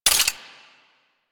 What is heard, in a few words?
Zoom Out; Sniper Rifle; Vulkar; Grineer